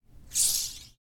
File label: Lasers